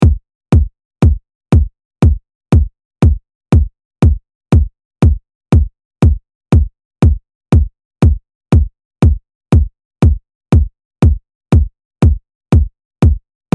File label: KICK; MobileMusic No1